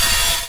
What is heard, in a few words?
cart rolling back grind; Team Fortress 2